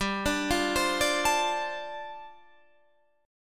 G 7th Suspended 2nd Suspended 4th G, Guitar Standard; arpeggiated; capo 9; chordshape; 15,17,15,17,15,17